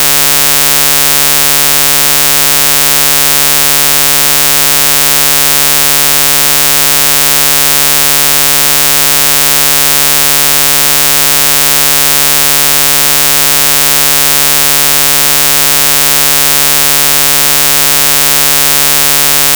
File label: PSWEEP playback; enzner2008